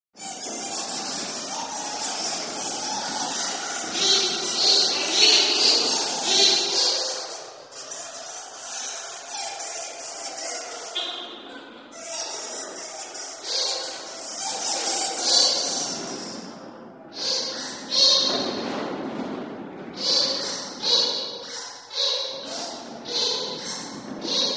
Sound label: Bat, Straw Colored Squeals. Reverberant Squeals Of Bats In Cave. Medium Perspective.